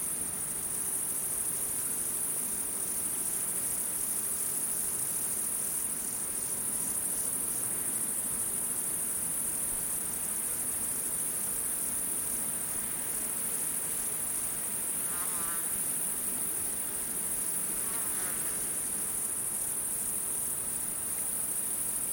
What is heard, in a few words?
crickets and insects in the wild ambience